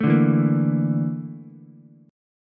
Bb Major 7th Flat 5th Bb Ukulele; BbM7b5 strummed; JGuitar

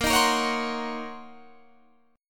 Eb Minor 13th Bb

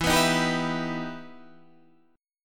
E Minor Major 7th E down Guitar Half Step Down

